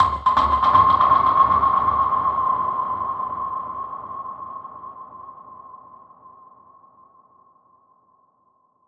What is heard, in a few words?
Perc Hit, dub percussion; musicradar